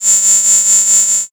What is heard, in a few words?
cicada